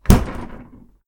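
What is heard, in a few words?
refrigerator; cooking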